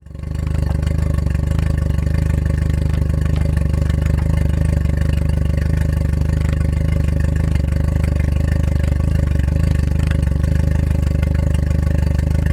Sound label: boat outboard motor flushing idle water flush through